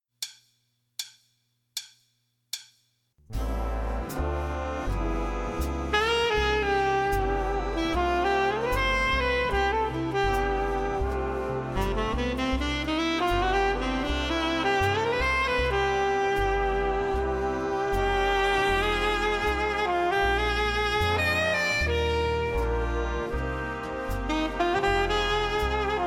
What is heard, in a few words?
alto sax solo